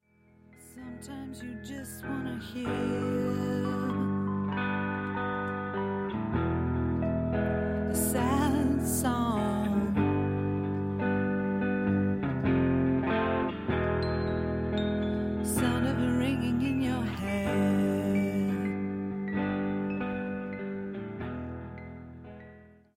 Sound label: Sad Song, Contemporary Indie rock